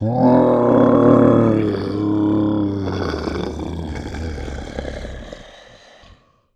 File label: die; agrunt